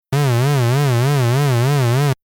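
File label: synth lfo sine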